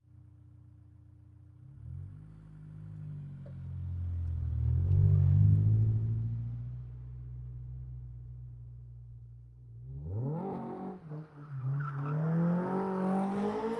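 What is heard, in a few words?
honda s2000 exterior circling up by away x3 wide; mkh8060; Sonniss GDC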